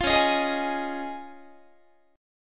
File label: C sharp Major Add 9th, Guitar; C#add9 strummed; JGuitar